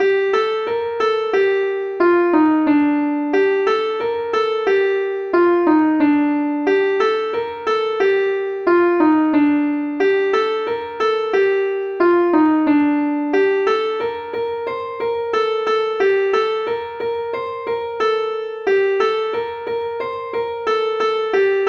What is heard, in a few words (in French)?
rond sautron